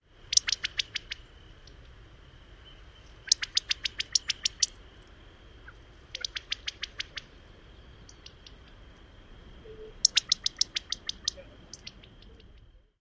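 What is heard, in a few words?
California Quail pit-pit alarm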